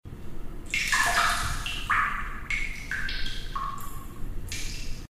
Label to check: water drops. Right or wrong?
Right